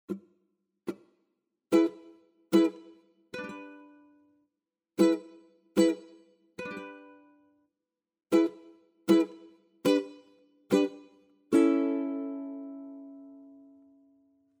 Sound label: Swing Ukulele Strums